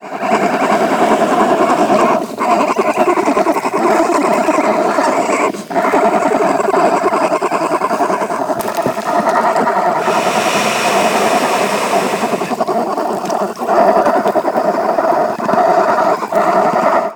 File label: much chumming breath